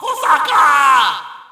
Shout, audience; UFC Tapout 2

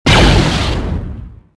alt fire

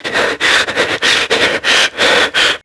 breathing; tenements

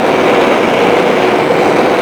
whoosh pitch; Scenery; Decors

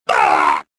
choke

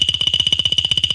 hopper; vehicles

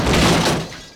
crush, Impact

